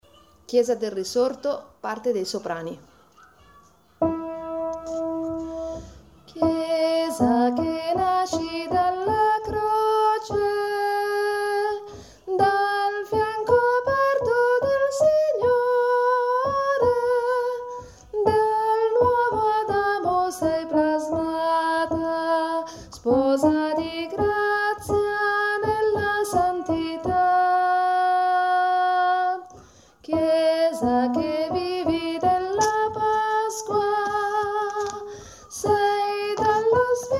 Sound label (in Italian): soprani